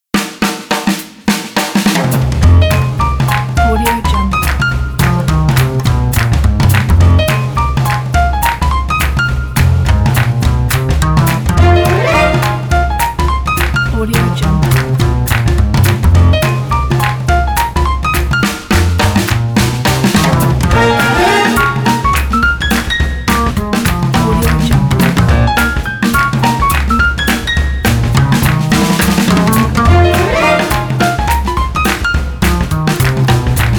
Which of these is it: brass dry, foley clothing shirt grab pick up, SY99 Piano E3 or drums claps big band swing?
drums claps big band swing